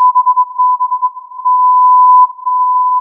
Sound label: filtered input